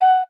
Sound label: flute, note; minecraft